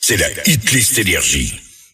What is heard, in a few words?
Acap; NRJ